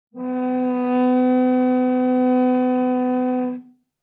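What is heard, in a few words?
Cello arco fortissimo sul C B3; MIS Pitches - 2014; Strings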